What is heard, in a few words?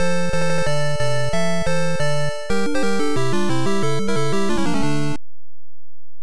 Game Start ~ Round Start; Devil World; NES